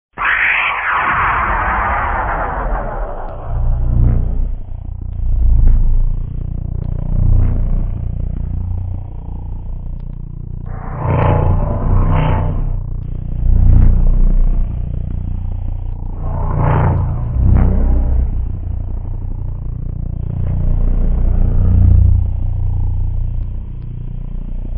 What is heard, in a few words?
lightsaber; vapesquared